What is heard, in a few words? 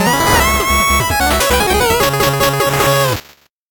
8bit jingle fast